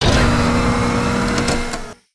Spider Boss Charge Up; creatures mechanical

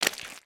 Foot Mud Walk Light; footsteps

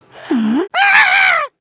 Surprised

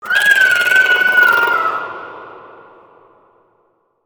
Spider Boss Baby Scream; creatures mechanical

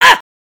minifig damage; foley

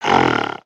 rock throw; creatures organic

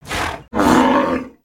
Pet Bison Disapprove, creatures; creatures pets

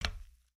Foot Steel Boot Walk; footsteps

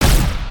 laser; creatures mechanical

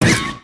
Spider Boss Strafe Fire; creatures mechanical

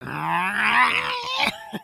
flail; creatures organic

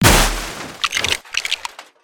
Metallic; destructibles

